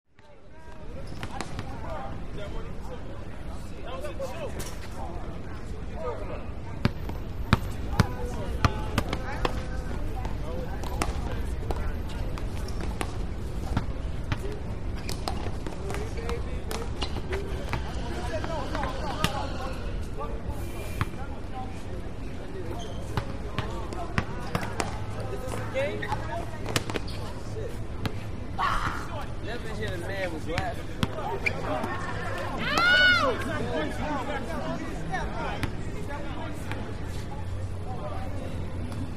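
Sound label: City Basketball Exterior; big city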